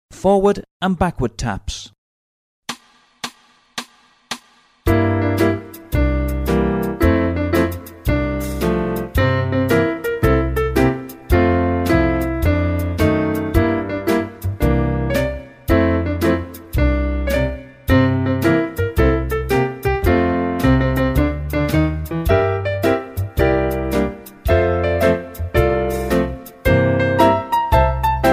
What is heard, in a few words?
Forward Backward Taps, tap